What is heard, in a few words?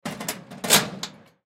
Gym Locker Close, Gym Locker Close Door Fast; Equipment; Sports; Blastwave